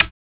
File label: rim